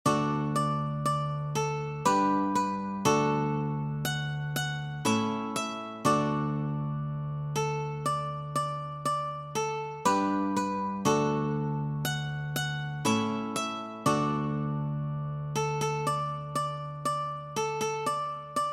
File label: Old MacDonald had a farm One String Ukulele